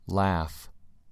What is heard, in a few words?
laugh